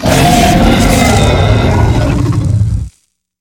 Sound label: big hurt, enemy droid; CosmicRage; combat